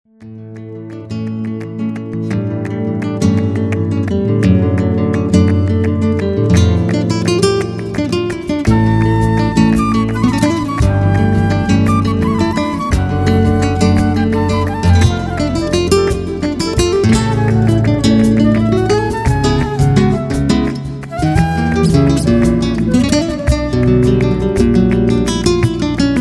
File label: Flamenco